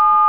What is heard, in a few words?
TONE